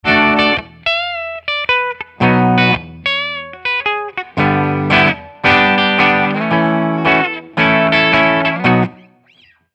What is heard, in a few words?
Gibson Robot LP Bridge